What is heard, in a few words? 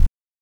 hover; Sonar Beat; Android